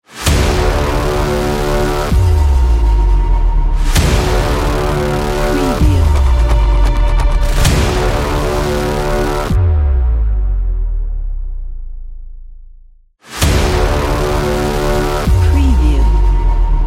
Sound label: hybrid ident logo